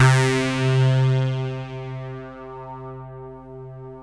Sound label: Phasiano; Best Service Gigapack 1